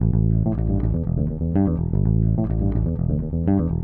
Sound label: DEEPHOUSE BASS 125 A